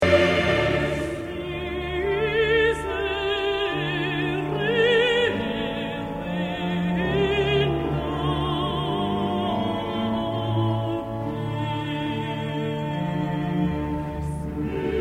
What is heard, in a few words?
trill soprano